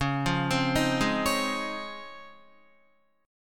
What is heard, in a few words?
Db Major 9th, Guitar; arpeggiated; JGuitar